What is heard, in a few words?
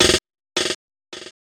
DRUMSHOT D+B 160, Drum+Bass, Singleshots; eJay